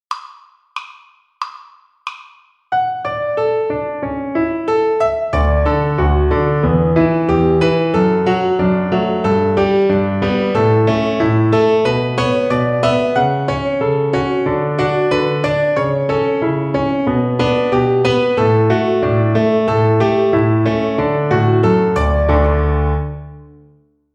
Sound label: String Gym, Piano Accompaniments; Etude 28 - Billions of Bouncing Balls!